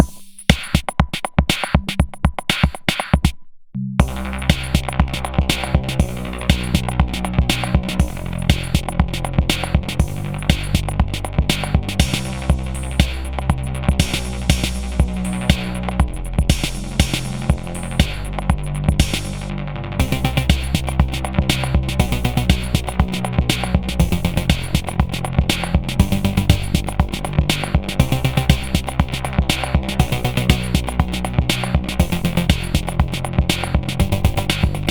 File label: Market Music B; Warframe; Venus; Fortuna